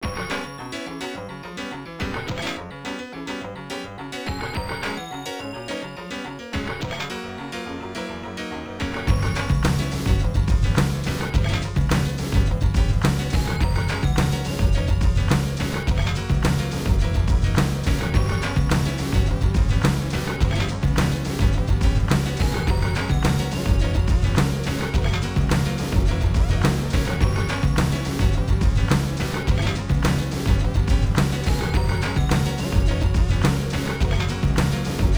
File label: Composite result announcement theme (Long version); Point Blank; namco nb 1 arcade